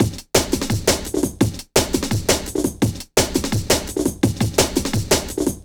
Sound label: Some Drum, Drum & Bass; Fluid Dynamics